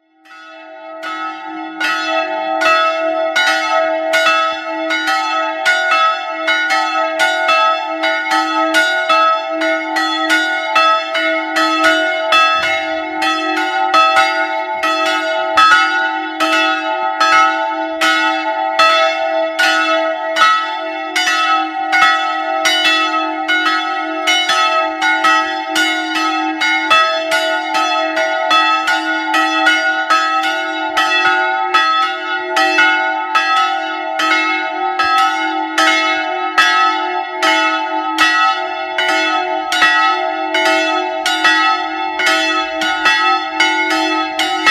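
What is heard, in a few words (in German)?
Tonaufnahme e2 fis2, mehrstimmige Geläute; 1971; Karlsruhe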